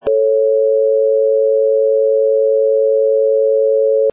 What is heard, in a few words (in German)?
Grosse Terz Rein, Geige